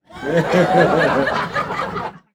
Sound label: Crowd Laughing; Vocals & Wallas; 1001 Sound Effects